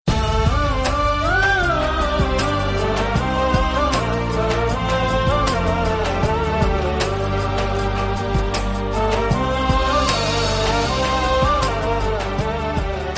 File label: mobile theme music ringtone; reliance mobile